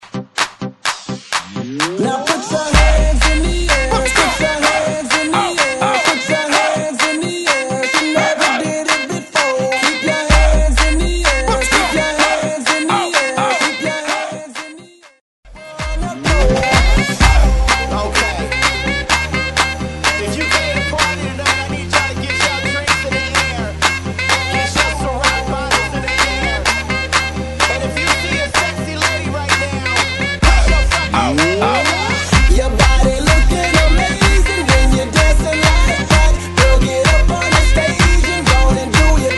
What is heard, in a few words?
REMIX BLEND